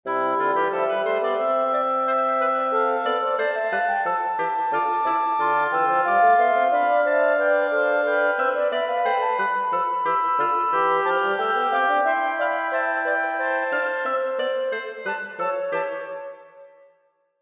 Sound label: Ascending sequence